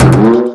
Barrel Bounce; Skylanders Cloud Patrol; Arcade, iOS, Mobile

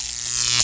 Radio Unfade

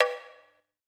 Taiko; SE Net#20 (SE Net07 Taiko)

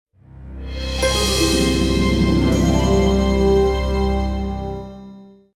ui logo; Venetica Gold Edition; deck13

